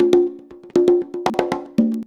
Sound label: CONGA 119, Modern Percussion Loops